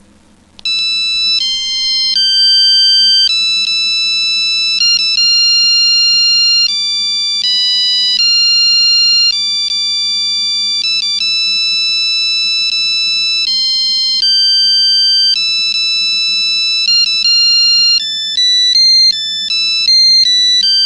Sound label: piano melody